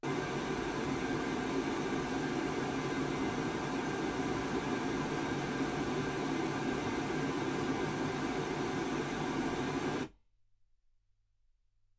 pink noise 48kHz

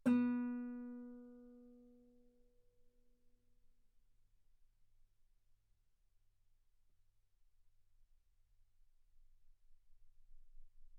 Guitar ff B3

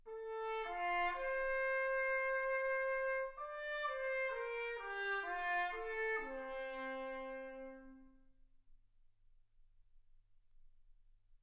trumpet

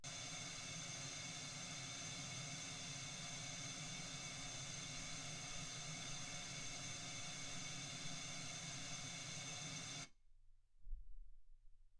wgn 48kHz

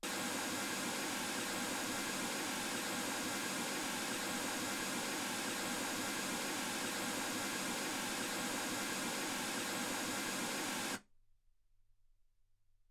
mls16 48kHz

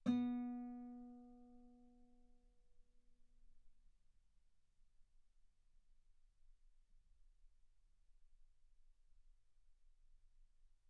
Guitar ff B3; sul B

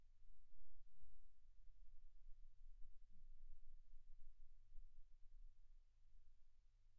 silence 48kHz

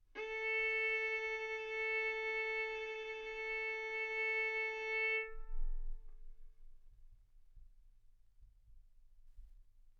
Violin arco A4 B4; mf; stereo

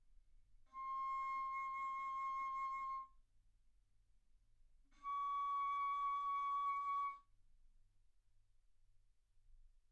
Bass Flute ff C6 Db6

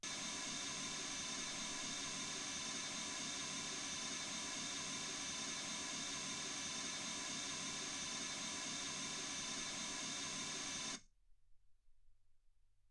mls16 48kHz

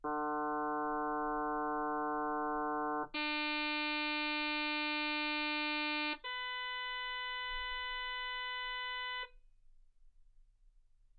harm sinus 48kHz

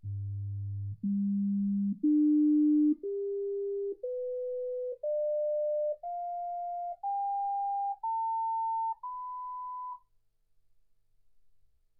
sinus tones 48kHz

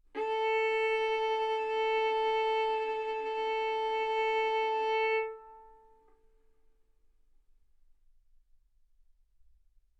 Violin arco A4 B4; mf; sul A; stereo

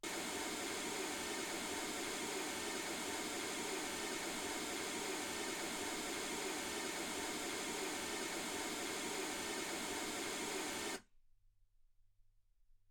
mls16 48kHz

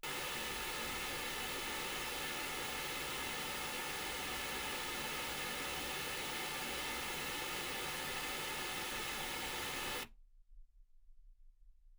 pink noise 48kHz

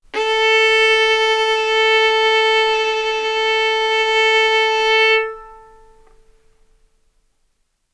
Violin arco A4 B4; mf; sul A; stereo; OmniSource

